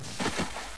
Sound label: roll